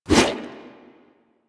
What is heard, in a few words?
Sword Swipe, Whooshes